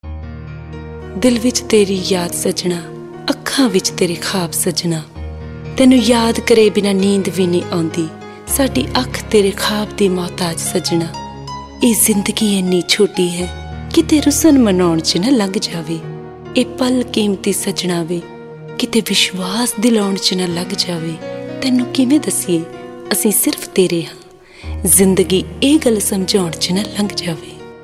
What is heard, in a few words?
lovely voice